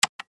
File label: Button Switch